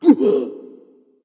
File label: breath; smite; voicepack; osiris